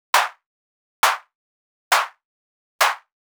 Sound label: Clap, EDM; Sonic Foundry (Sony Creative Software); Afterhours EDM